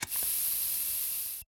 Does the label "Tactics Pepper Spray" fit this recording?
Yes